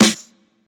Small Reverb Acoustic Snare A Key, acoustic snare sound tuned to the A note; snare-drum-samples